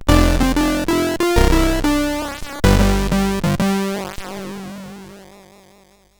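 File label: Lights On, MOS CSG8580R5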